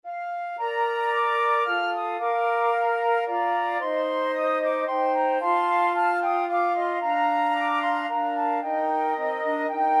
flute quartet

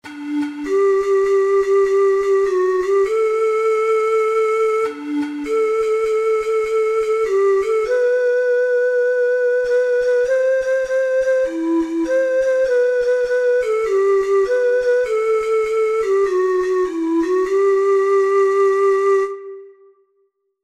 happy and you know it, ocarina four hole

